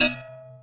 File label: bing